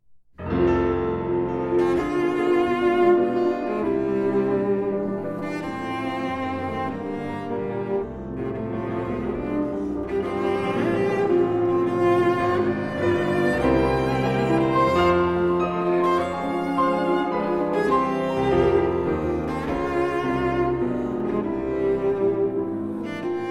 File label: sonata for cello and piano